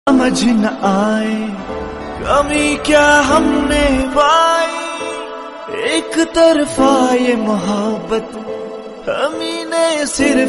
Hindi Song; ringtones

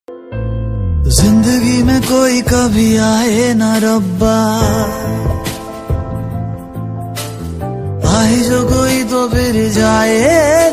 Sad Ringtone

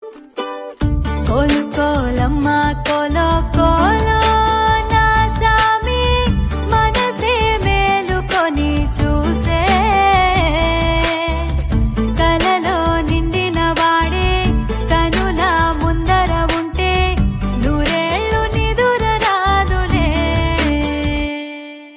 South Song Ringtone

